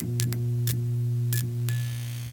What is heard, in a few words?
light fluorescent hum flicker; ProSoundCollection v1 3 MONO